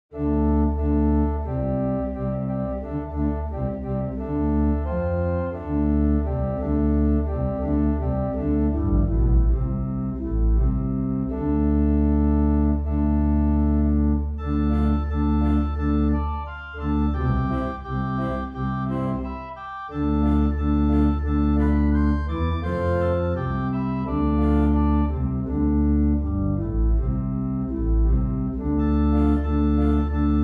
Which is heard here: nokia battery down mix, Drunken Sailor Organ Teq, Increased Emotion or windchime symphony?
Drunken Sailor Organ Teq